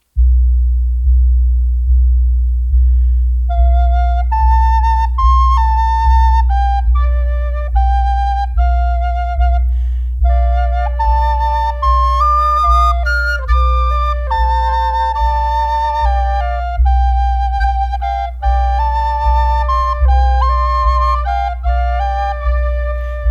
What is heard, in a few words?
flutes